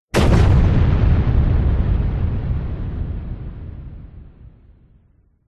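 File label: explosion large dynamite; zapsplat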